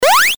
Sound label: jump